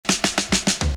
Cincinatti Roll

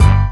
Hit

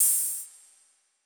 Cymbal Real; Crashes & Cymbals

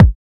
Kick

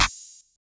Clap

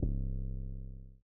808's

